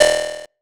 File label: Fx Vox; Equipped Music; Loungin House